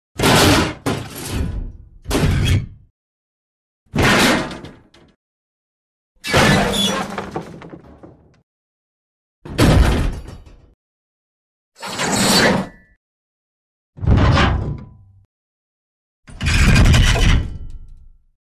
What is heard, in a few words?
Metal Scrapes & Bends Heavy Impact With Scrape & Ben; Hollywood Edge Foley Sound Library